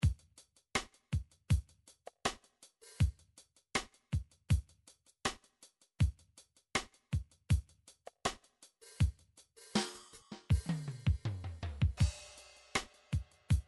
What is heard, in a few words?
drum midi beat; Drum Midi Files Collection